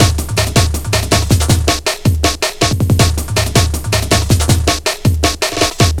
160, Drum Loops; Total Drum&Bass

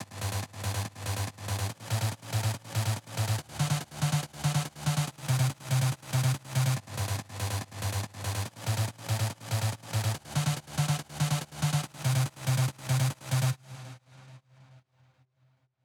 PWM Kit 142BPM Chorus - Sublead WET, Gmin; Vengeance - Essential Clubsounds Vol.5; Melody & Synth

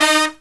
LOW HIT, Brass

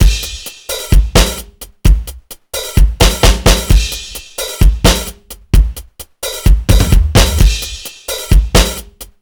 ROCK, Funky Xtreams; INIS

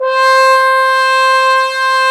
FRH, Horns; Sound & Vision - Gigapack I CD 2 (Roland); Brass&Woods1

